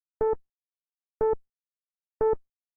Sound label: Countdown